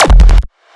Strange Kick, raw hardstyle kick; raw hardstyle kick pack 1

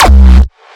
Strange Kick, raw hardstyle kick; raw hardstyle kick pack 1

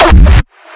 Gated Kick Triplet, raw hardstyle kick; raw hardstyle kick pack 1

